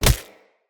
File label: Unarmed Punch Impact Default; Weapon Impacts; Player; Combat